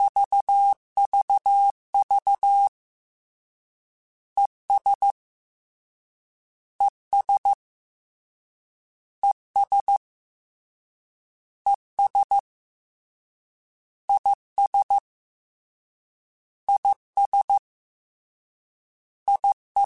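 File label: 3 Letter Contrasts 13, Morse Code; CW Code Groups; Mixed; HamRadio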